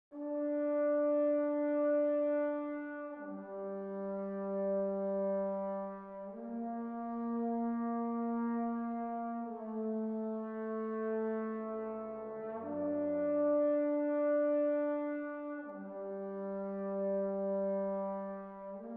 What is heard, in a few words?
Trombone